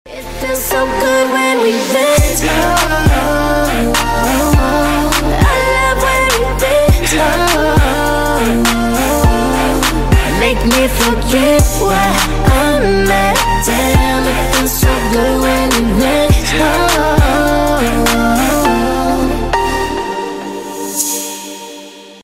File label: Remix